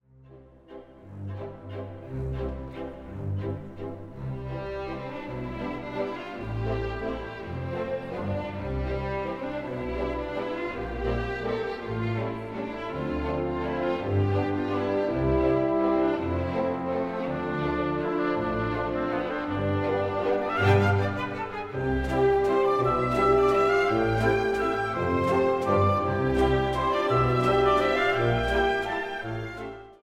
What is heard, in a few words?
Orchestra Concert Sample